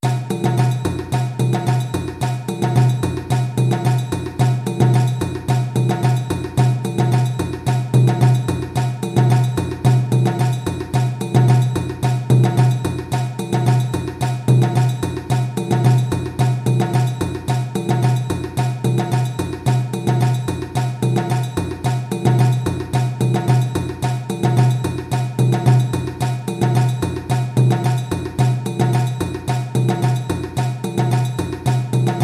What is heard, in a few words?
Baga Giné Groove 220 bpm, djembe